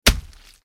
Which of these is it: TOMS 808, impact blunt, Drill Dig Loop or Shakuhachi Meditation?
impact blunt